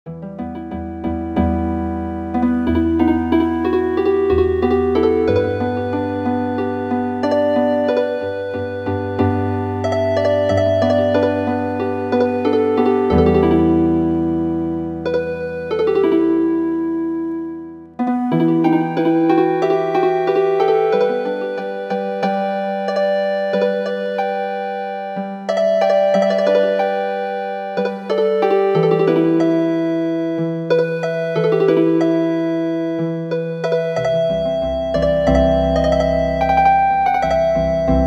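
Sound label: Healing Harp, traditional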